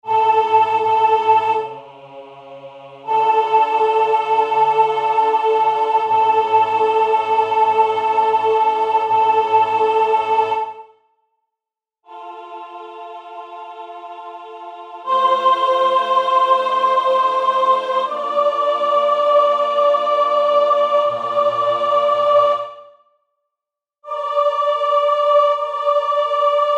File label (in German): Adagio2 Sopran; Salve Regina